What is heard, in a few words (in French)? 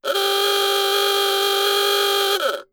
KLAXON LONG